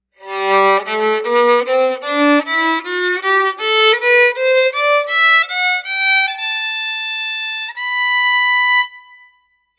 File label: mic test with noise removal and a little reverb